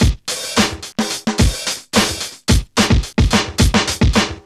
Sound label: RAW LOOP; Zero-G; Funky Elements